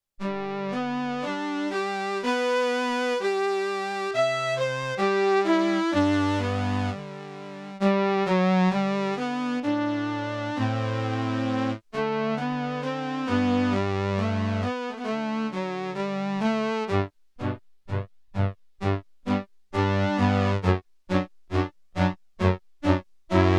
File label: CELLOS, SIEL DK 80; Factory